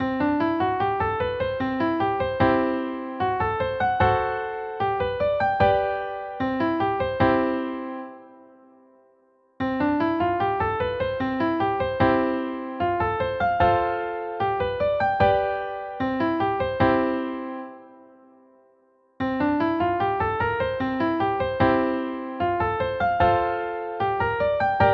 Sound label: c lydian to b lydian